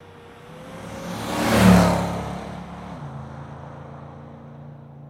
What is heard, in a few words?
Camaro 1969 Fst By, chevy camaro 1969